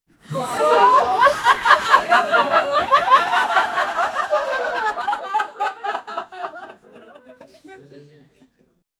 a group of friends laughing hysterically

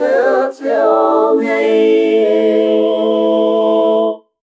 80s video logo intro style

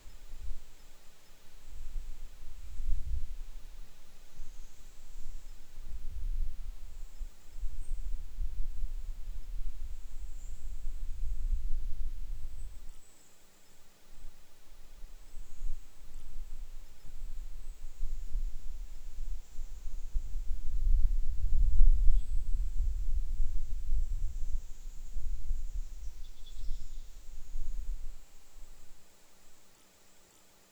forest night quiet with

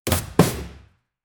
quick whip sound in a comedic skit

